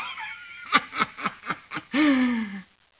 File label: laugh; women